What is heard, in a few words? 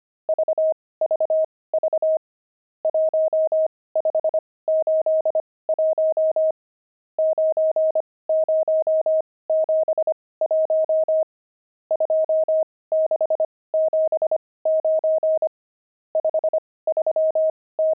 pangram, intermediate pangrams files